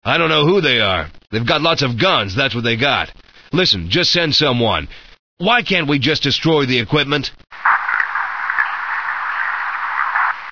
grunt; sven; dialogs

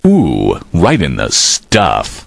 die; postal dude

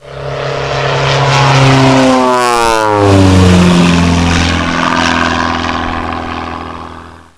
flyby

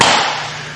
spank

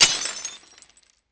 glass

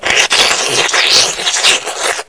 slurp

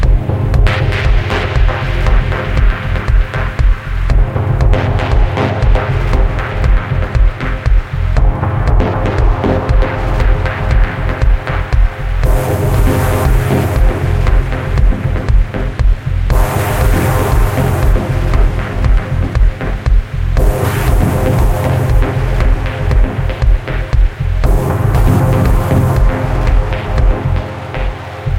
Dub Techno Mixtape

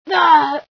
pain, female sci

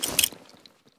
draw, wpn

